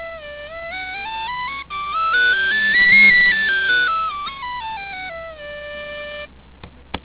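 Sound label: high d scale, whistle; jubilee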